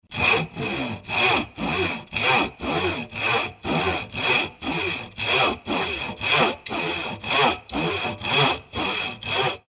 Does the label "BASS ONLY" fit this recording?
No